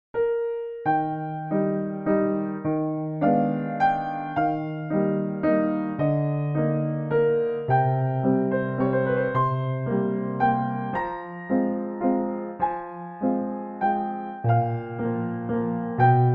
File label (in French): extrait piano classique